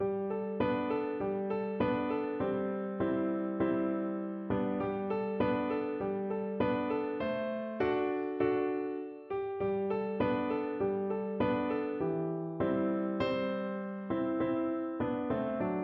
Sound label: piano